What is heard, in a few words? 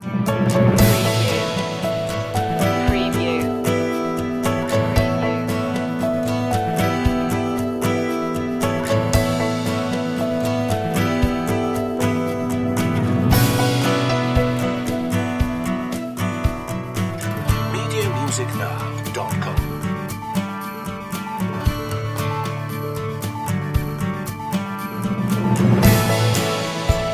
good morning guitar